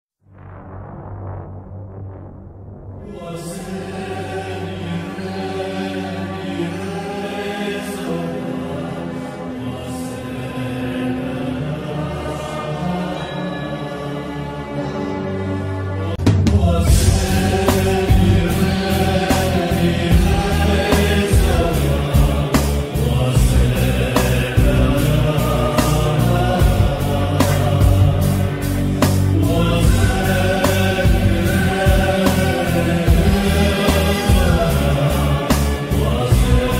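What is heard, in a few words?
background music instrumental music; ra one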